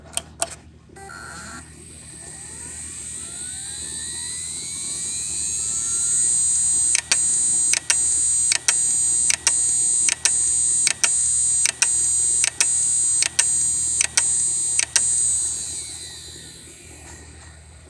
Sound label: abnormal sound